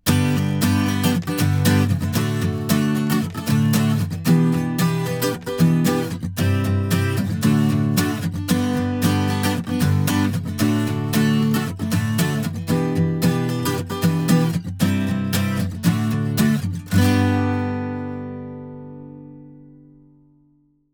440 PURE AG; Lewitt; ポップスのためのアコースティックギター録音; C-02

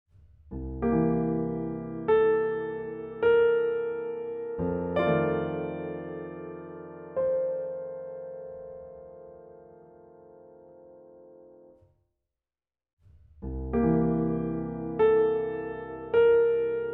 detuning small

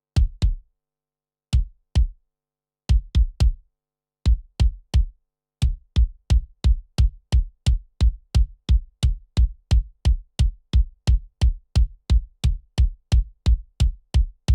Kick 1073LBEQ After; キックサウンド試聴; Neve 1073LBEQ使用後; 強いセンター感をフェージングで少し広げて、より生ドラムっぽいサウンドに仕上げています

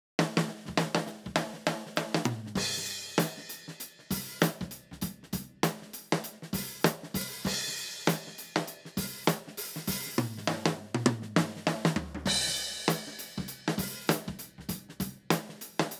Drum TOP; 1073OPX

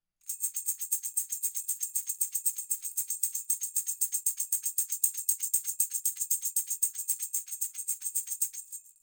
Tamb; LCT040

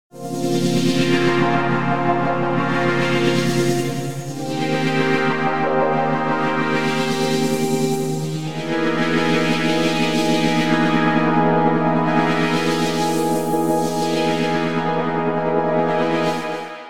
Pad

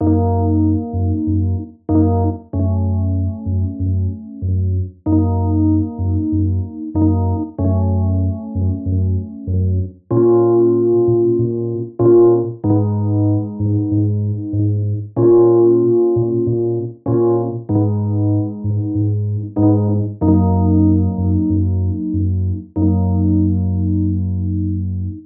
delay modulators fragile chorus wet; aas; objeq delay

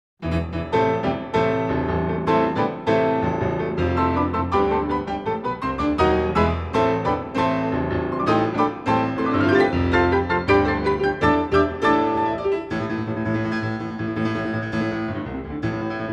agitato; PM40T; QTC40

